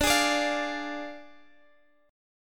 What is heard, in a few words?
D Diminished, D